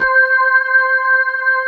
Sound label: B3 HAM, Keyboards Old School; USB Soundscan vol.09; AKAI